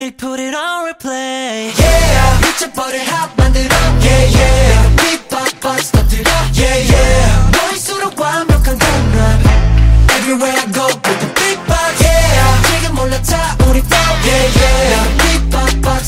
BEATBOX RINGTONE

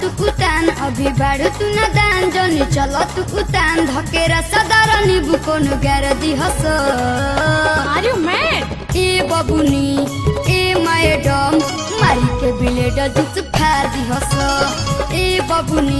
BHOJPURI RINGTONE

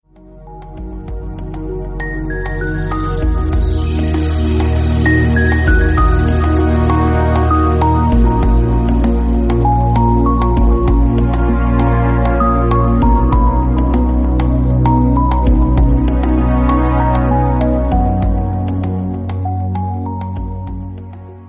AMBIENT RINGTONE

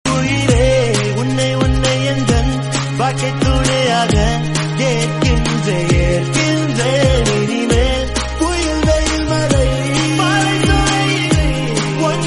FEMALE VERSION RINGTONE

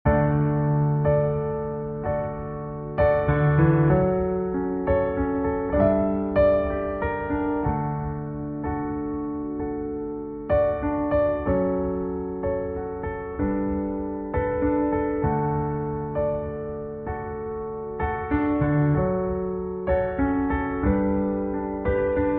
INSTRUMENTAL RINGTONE

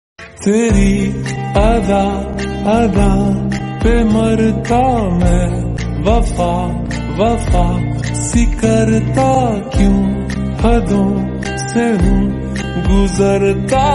LOFI SONG RINGTONE

